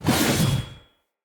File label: Sphere Dual Sword Whoosh Heavy; Weapons; Player; Swords